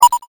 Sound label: beep end